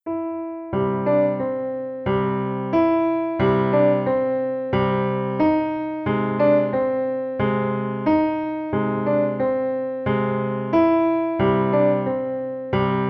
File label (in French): Reggae, Piano seul; Jazz